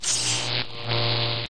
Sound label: sound buzz; replicaisland; android 6.0.1